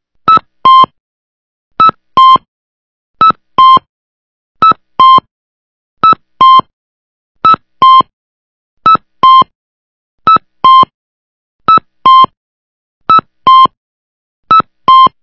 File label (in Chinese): 南北向導引音響(布穀聲)